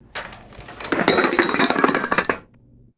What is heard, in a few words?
golf balls rattling down a dispenser chute; sports